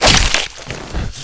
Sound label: knife hit, weapons